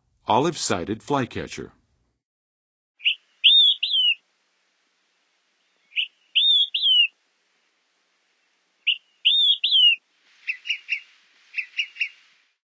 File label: Olive-sided Flycatcher; North America; Western USA; Western USA Songbirds